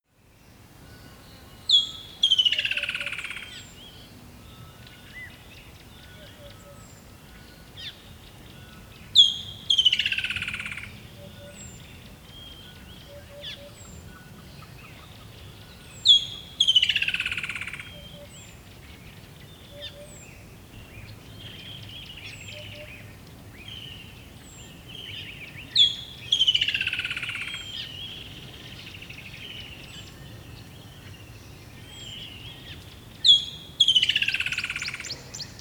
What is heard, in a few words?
river dawn bird close